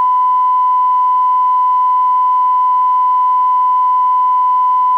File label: 1kHz